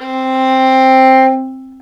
violin

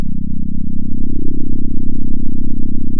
center, sound of a small motorcycle

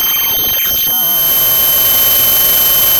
Sound of a cat meowing

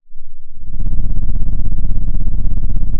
pop, Sound of an engine revving

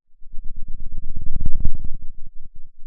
Sound of a thunderstorm, center

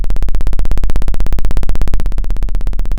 Sound of a plane taking off

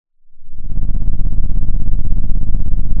Sound of an engine revving

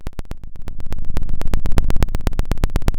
pop, Sound of a fire crackling

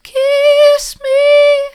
kiss me soft, vocal

